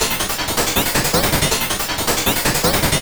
DESK; USB Soundscan vol.33; AKAI; Disjointed Textures; RYTHME

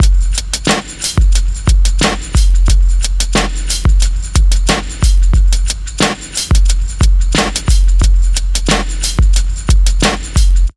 BEAT, Underground Hip Hop

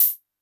vermona drm1 mk3 hh

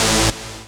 saw lead synth

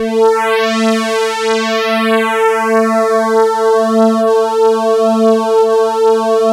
PAD, Synth Pads; SYN-PADs; Synth-Pads 4

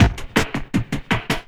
LOOP, 160 BPM, Drum & Bass

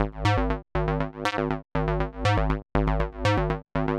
120 Prog Chopz Synbass; Zero-G; Soundclash Nu Dance Electro; Combi Loops; 120bpm

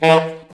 SAX A. STAB, Alto Section; Roland L-CD702

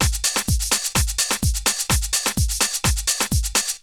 Essential beats; FM 57 - The Ultimate Sample Collection (1997)

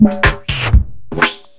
FILTER FILL

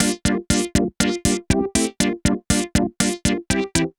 Resos A, 120 bpm, G, modular; modular madness

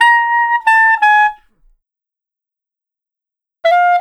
Clarinet; Sonic Foundry (Sony Creative Software); Jazz Solos and Sections

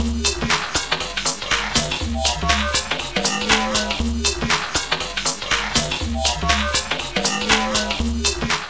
Drum Loops Crazy Processed, 120

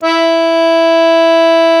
BANDONEON E2; Best Service; Alpine Volksmusik

